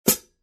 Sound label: 14in Custom Mastersound HiHats, cymbal